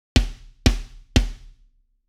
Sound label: Kit Boost